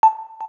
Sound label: KEY XYLOPHONE 9, keytone